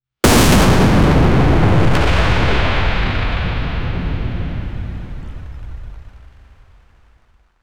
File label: epic sci fi explosion; energy